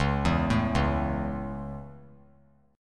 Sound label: C sharp Minor Sharp 5th; C#m#5 arpeggiated; JGuitar